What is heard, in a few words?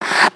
ice skate